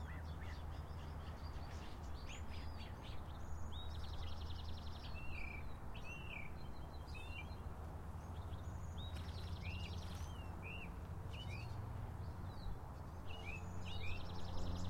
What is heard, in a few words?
Field Station, Chicken coop